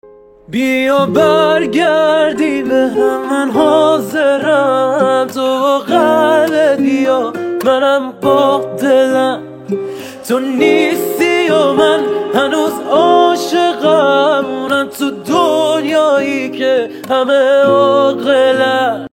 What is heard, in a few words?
Guitar; ورژن با گیتار